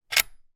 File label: gun mossberg; still north media